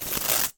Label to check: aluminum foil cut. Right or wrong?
Right